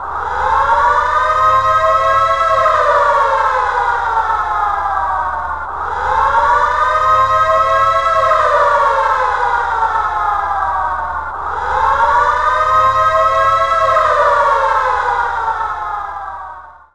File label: SIREN; interface